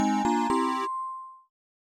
Video Game Level Complete

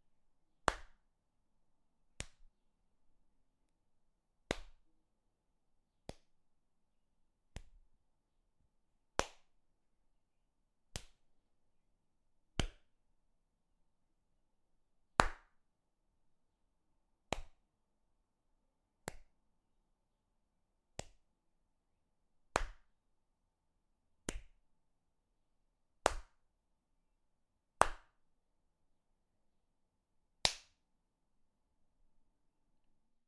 Slaps

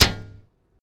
Shield Slam

